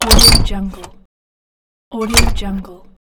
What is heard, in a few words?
door wood chamber open close